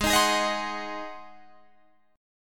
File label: G sharp 7th G sharp, Guitar Drop D